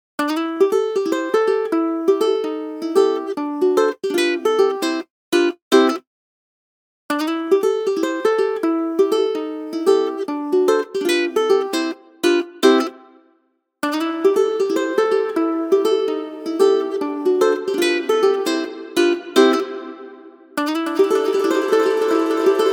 Reverb, ukulele

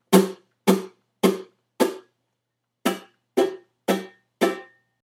strum block, ukulele

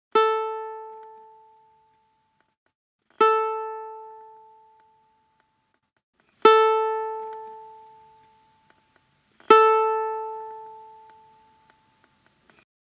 Compressor, ukulele

